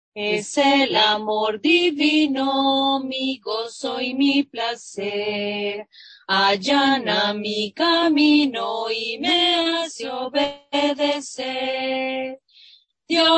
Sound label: God Is Love (Spanish)